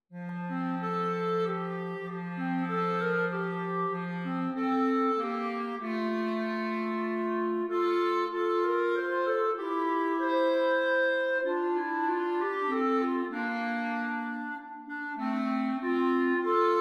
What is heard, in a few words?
Clarinet DUET